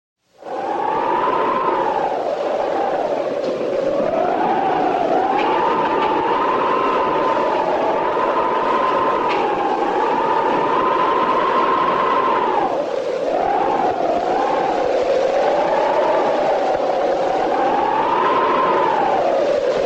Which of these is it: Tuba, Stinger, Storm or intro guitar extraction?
Storm